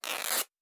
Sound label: Cloth Ripping; Survival Game Sounds - Lite Edition